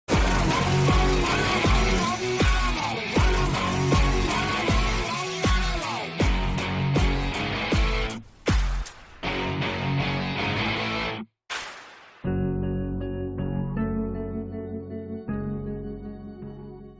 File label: Ringtone